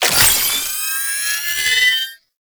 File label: Sniper Drone Charge Up; Warframe; Lasrian